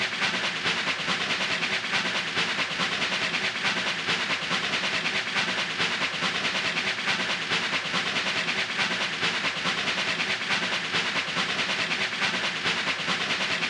Reel Train Free spin Loop; netent

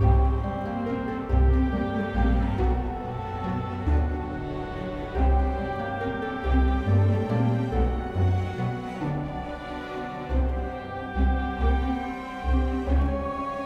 intensity 3 music; netent

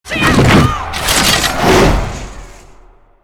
shield Wall Start; netent

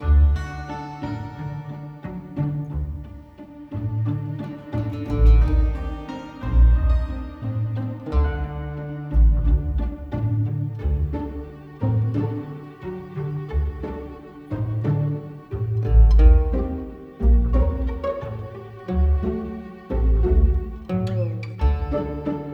ambience Music; netent

